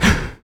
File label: Body Perc, Human Perc; Spectrasonics; Supreme Beats; World Dance